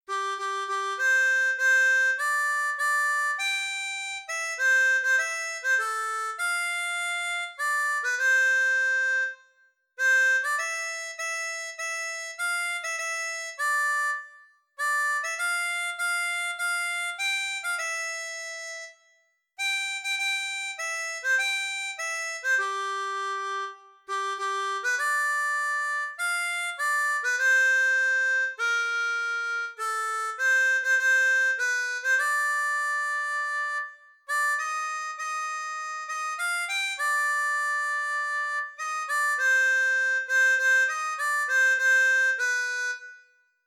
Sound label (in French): Hymne Francais, harmonica